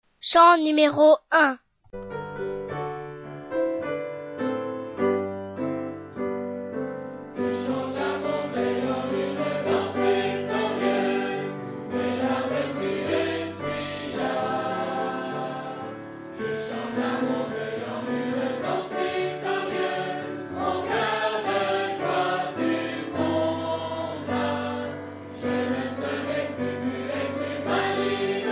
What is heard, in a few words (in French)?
Chant; FR